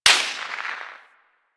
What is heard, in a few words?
Bullet Whizzby; Warframe; Weapons